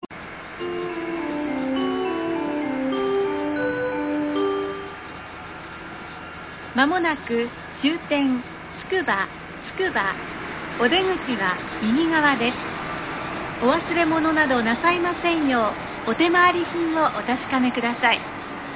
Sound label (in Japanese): つくば到着前, 車内チャイム 車内放送; tsukubaexpress; 区間快速（秋葉原→つくば） つくば行き区間快速。2005年11月; つくば：終着用